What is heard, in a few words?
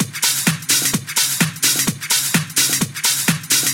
House Loop, 128 BPM; Vengeance Samples